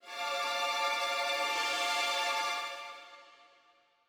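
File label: Chord cymbal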